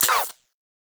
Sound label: SMG Mech, Weapons; Warframe; Tenno; Protea